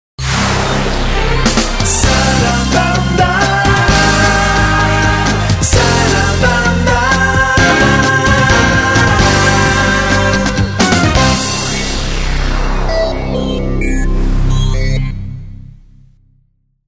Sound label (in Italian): Sigla; Sarabanda